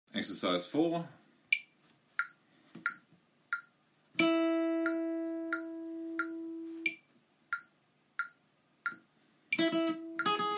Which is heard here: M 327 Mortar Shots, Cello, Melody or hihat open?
Melody